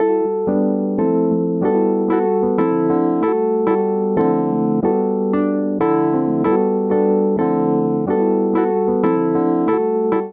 Vamp Jazz Comping 5/8 G Major 93 bpm, Electric Keyboards; Ableton; Factory Packs